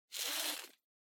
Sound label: Stopwatch Digital Strap Lengthen